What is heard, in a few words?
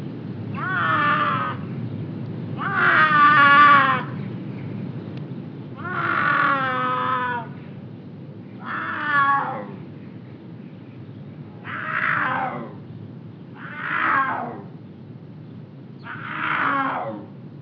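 Panthera tigris juvenile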